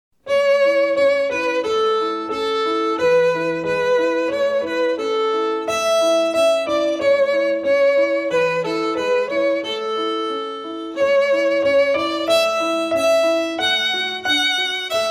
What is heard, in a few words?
Go Tell Aunt Rhody, Folk Song, violin; Suzuki Violin Method